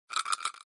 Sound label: dice roll